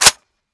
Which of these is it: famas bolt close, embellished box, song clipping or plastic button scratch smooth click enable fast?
famas bolt close